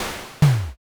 garbage

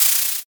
paper

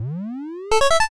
cabinet boot

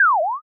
slip

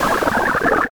drown

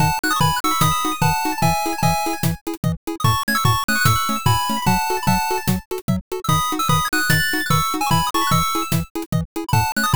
bgm bright

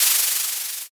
curtain

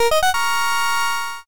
join

